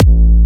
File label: Jump Kicks, Bassdrums